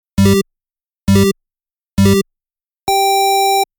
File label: countdown; DiDi Dash